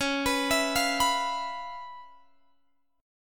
Db 7th Sharp 9th Db down Guitar Open G

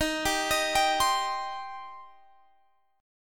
Eb 6th Eb down Guitar Standard

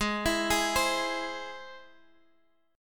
Ab Augmented, Guitar, Drop D

